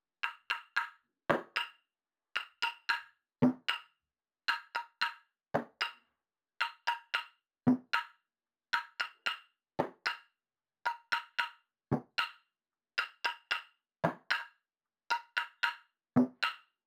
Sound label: percussion loop 113 bpm; percussion loops; bespoke - Floral v.1